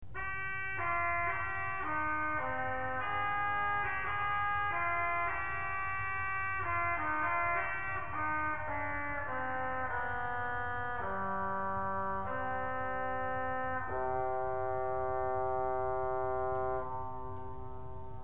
oboj

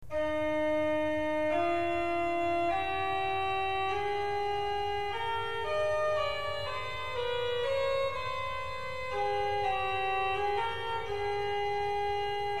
kornet z 8

